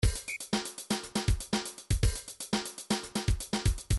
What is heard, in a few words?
disco 2bar